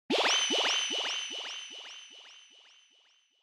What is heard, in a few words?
Motion Agility Delay